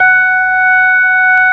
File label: ORG B3, organs; Sound & Vision; Gigapack I CD 2